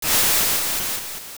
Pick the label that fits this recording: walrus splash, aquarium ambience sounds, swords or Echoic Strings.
walrus splash